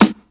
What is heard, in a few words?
nineties puffy snare drum sound g sharp key